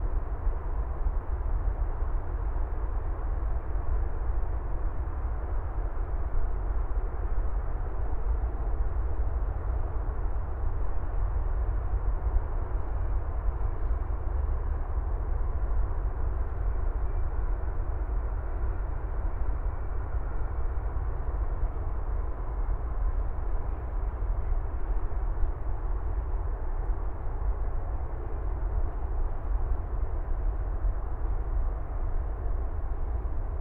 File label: Distant City Ambiences; Detunized